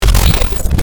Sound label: glitch sound effect